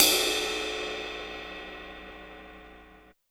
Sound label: CYM, 180 BPM; Ueberschall; Drum & Bass Flash Trackz